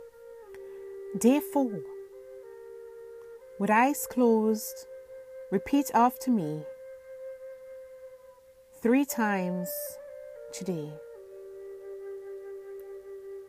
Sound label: healing mantra